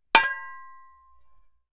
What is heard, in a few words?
Metal; TOOLKIT vol.2; DET24 ElementalMysteries